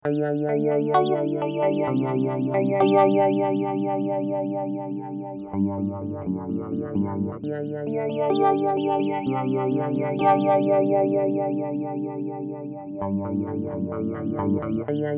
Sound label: Electric Mistress Flanger